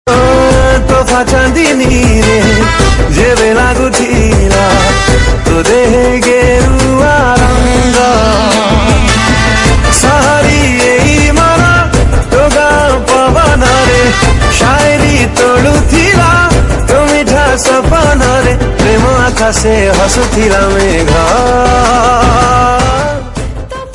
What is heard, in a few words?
Odia Song